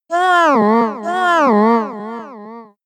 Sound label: Yawn